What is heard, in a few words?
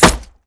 wall stick, scissor